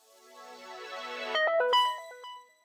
Power On

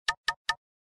Knock; KV755; SMS Tones

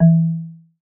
Marimba, notifications

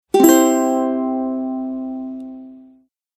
Guitar; BlindShell; BlindShell-Classic-2

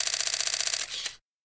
camera continuous high short; Sharp SH-01D

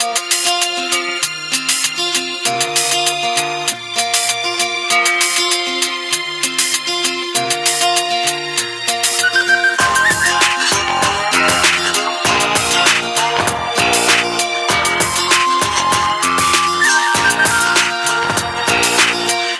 music chill out, ringtones; Samsung GT-i7680; cmcc

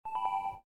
SMS in Call, phonetones, ui; LG Optimus G F180K

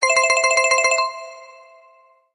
Default Ring, ringtones; Pantech Breakout

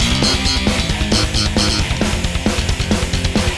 ringtone, rock; ringtones; No Limits; Google; NewWave Labs

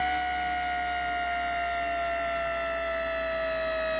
zoom out; Samsung SGH-i907 Epix

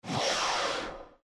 bounce

